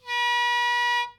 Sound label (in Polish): trabka 44khz